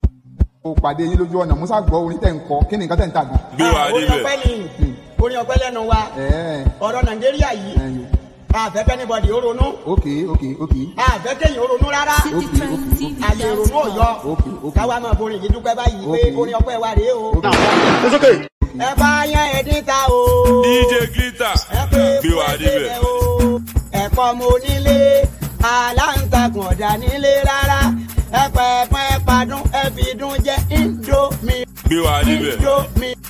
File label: Beat